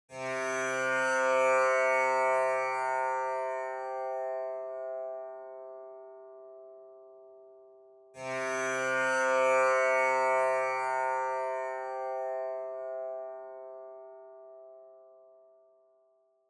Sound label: Tanpura